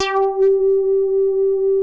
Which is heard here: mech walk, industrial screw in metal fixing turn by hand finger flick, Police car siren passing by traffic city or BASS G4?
BASS G4